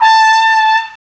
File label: FRENCH H; CMI Sound Library Rev 1.3; BRASS